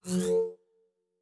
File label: Device Start, Electric; Sci-Fi Sounds